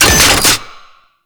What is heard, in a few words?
Front Line Ammo Pickup; Tau; Twelve Minute War